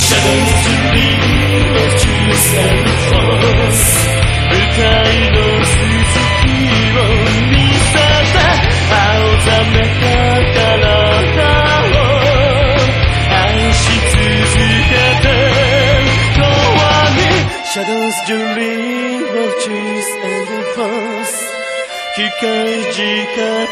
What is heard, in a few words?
temple; shadow temple